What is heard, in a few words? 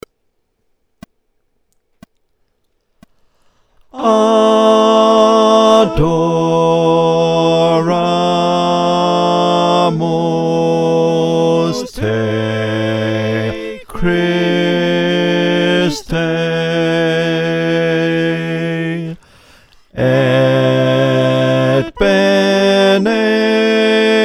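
Bass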